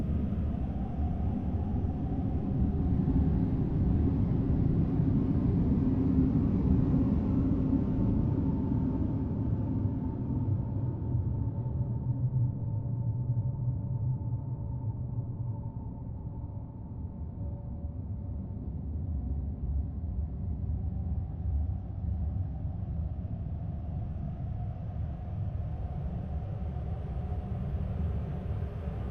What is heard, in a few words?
Sand Blasted Tunnel Ambix; Deep Rock Galactic Boardgame